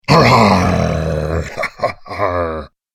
Pirate Snarly Laughter, Cartoon Voice Sound Effects